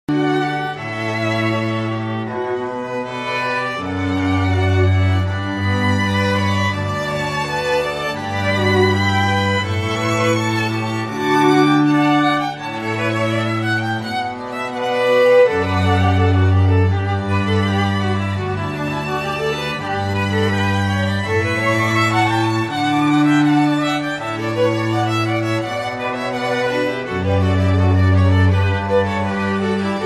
Classical

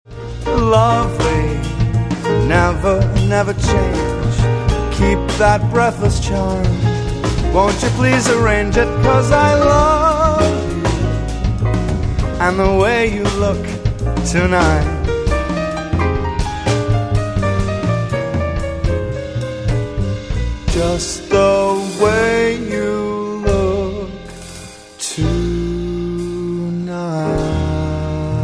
swing; Keyboard, Bass, Drums, Vocals

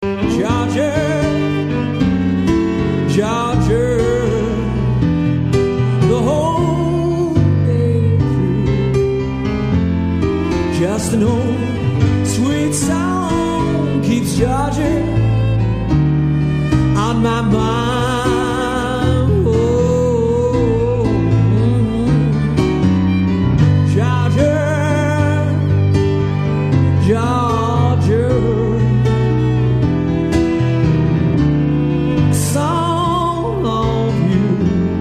Fiddle

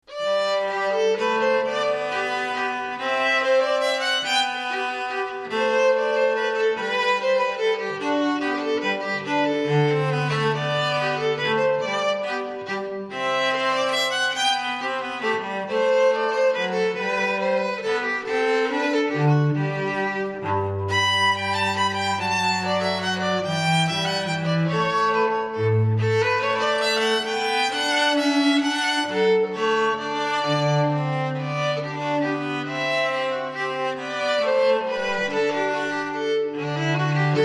Strings

